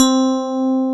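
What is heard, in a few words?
ELECTRIC PIANO WAVE; AKAI S6000 CD-ROM - Volume 1; SAMPLE WAVE MIXING 1; 128 PRO SYN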